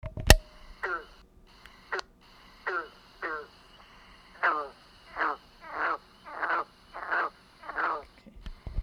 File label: green frog